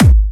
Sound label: Bassdrums Clubhouse; Vengeance Samples; VEC3 Bassdrums; Clubhouse Kicks